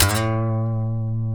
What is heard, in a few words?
B, funk guitars